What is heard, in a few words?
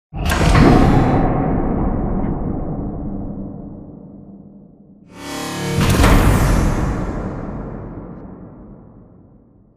Giant Floor Panel Assemble Final Lift Mech; Boomtown; Sideways Town; Titanfall 2